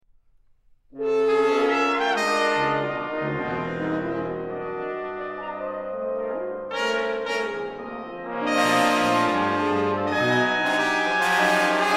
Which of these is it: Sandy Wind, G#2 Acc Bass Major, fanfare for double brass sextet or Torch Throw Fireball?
fanfare for double brass sextet